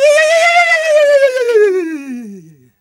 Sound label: Male Voice, African Voices; Quazibeat; African Secret; Male Vocal